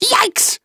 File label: hit; WitchDoctor